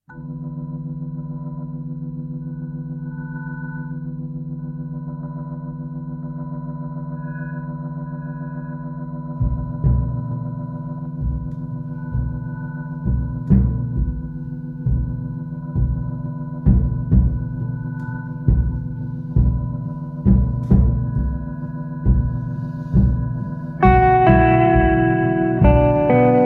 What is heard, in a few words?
Slow Blues